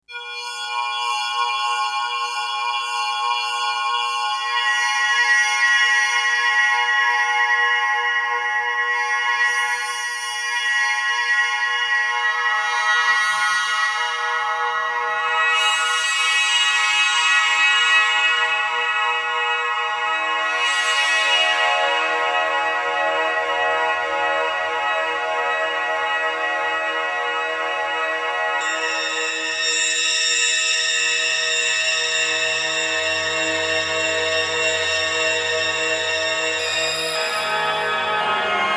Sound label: Blofeld